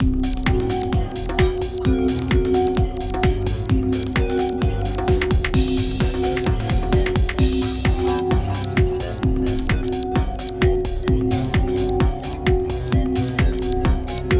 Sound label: Techno Version